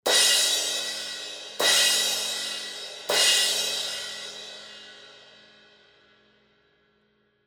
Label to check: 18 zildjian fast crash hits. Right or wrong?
Right